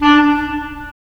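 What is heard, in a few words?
wind clarinet; Roland - LA Composer vol. 3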